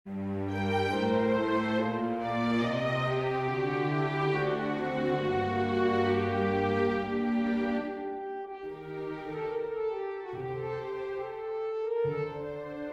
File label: for winds strings; purcell henry; when orpheus sang all nature did rejoice